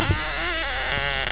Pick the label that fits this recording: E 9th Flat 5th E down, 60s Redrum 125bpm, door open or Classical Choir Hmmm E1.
door open